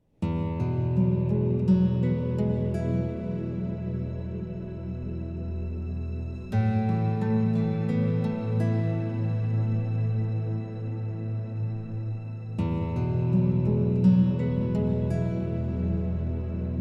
European Guitar; Grand Ages Medieval

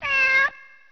Continue; Shogun Warriors arcade gamerip 1992

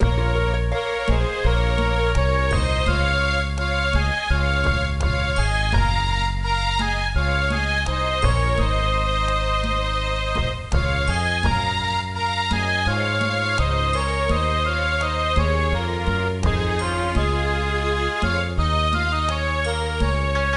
BGM Spring

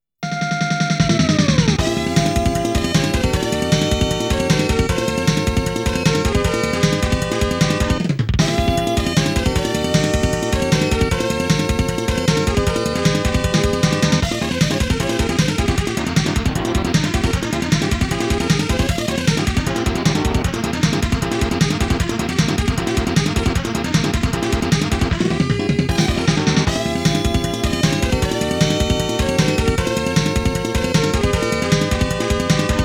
Battle theme; Estpolis Denki 2; Lufia 2 Rise of the Sinistrals

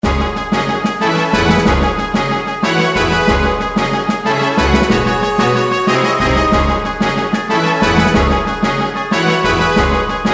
Battle; Draw Your Sword; Shining Plume 2 Windows gamerip 2017

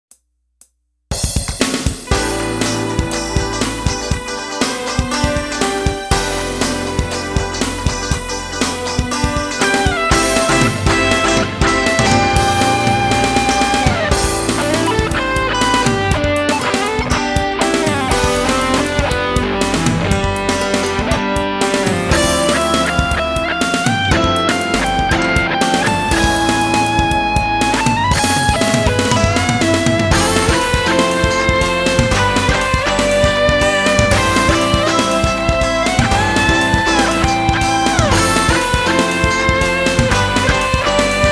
Drum Minus Track

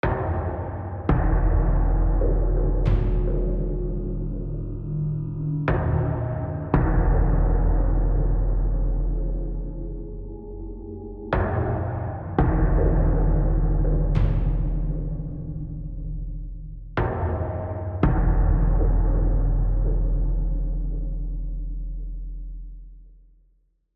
Tension; AB Tension 5 High Block 2

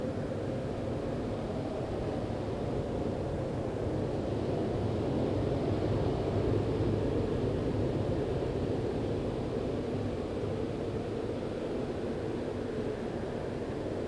Northwind Ambience; MechAssault 2: Lone Wolf; Xbox

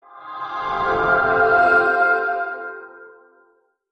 ressurection sound; Arc of Templar

